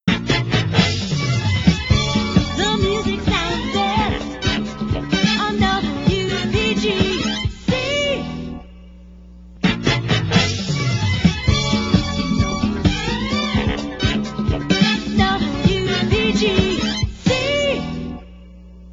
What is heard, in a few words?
jingles the music sounds best